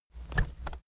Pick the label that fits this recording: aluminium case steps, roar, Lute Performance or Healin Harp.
aluminium case steps